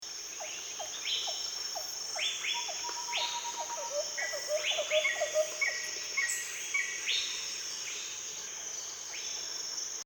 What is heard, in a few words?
Jungle Soundscape; Dungeon of Elements